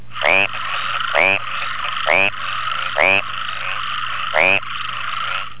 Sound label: mascarene rocket frog